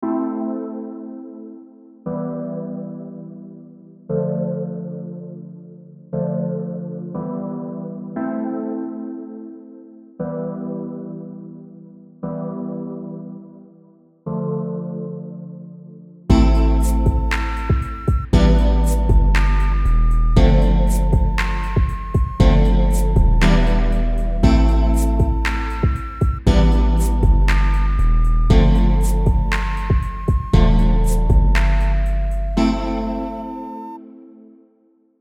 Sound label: Sinewave